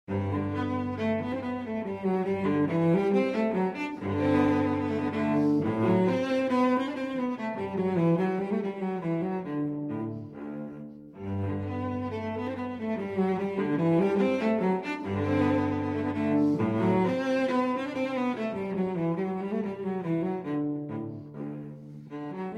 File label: Cello Suite in G Major Menuets I & II; Cello Suite No. 1 in G Major, BWV 1007